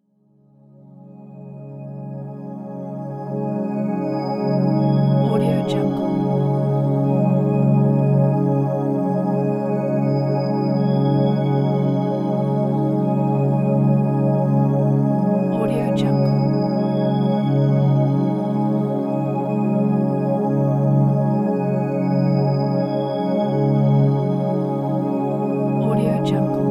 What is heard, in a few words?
galaxy